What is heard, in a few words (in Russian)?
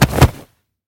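боевой захват с броском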